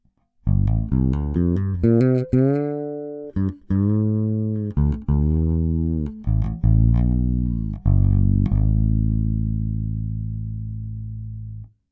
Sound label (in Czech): Baskytara Cort B4FL, bezpražcová, 4 struny, D'Addario Chromes, flatwound (hlazenky); v konfiguraci snímačů S-S